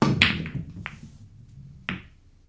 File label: Billiards Shoot Cue B